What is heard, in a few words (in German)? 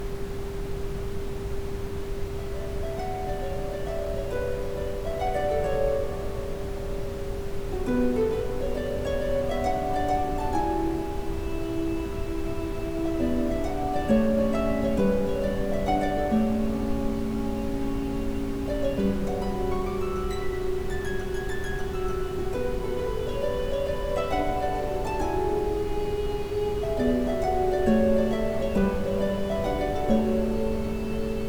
mit Ambient Sound; chakren; Muladhara Genesung